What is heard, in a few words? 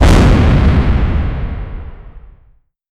thunder dash for soccer